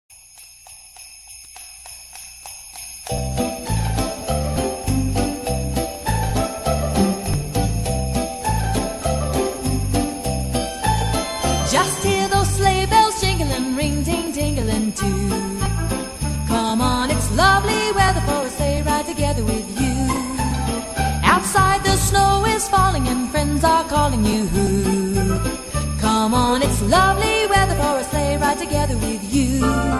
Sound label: ringtone